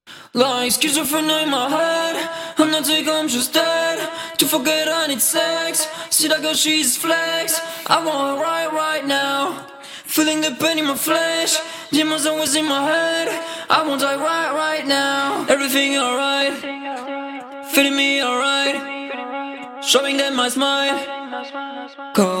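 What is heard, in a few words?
dance pop 135 C sharp minor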